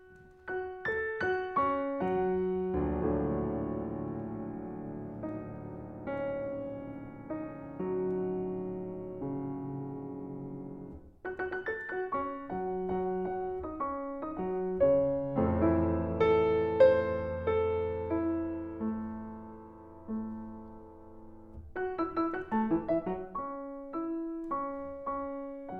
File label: Ballad